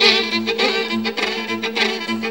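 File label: VIOLIN GROOVE; Best Service; World Colours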